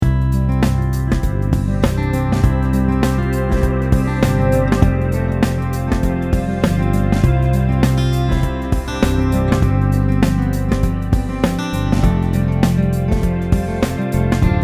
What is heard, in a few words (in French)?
Ballade rockyfolky